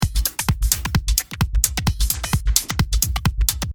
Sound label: Beat 130